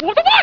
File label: throw; LuiKang